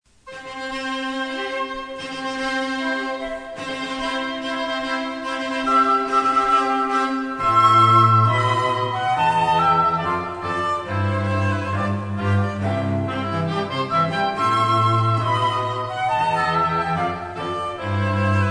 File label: Wedding March, Cello Trio